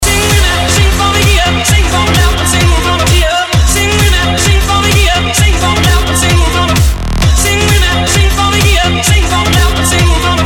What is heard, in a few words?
Melody, RingTune